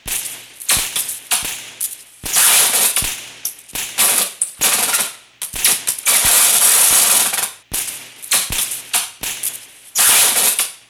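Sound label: transformer large spark loop; Explosions; modern warfare 2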